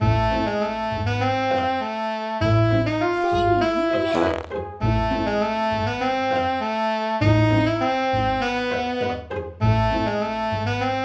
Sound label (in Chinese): 主界面